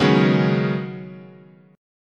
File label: C sharp 7th Suspended 2nd Suspended 4th, guitar chord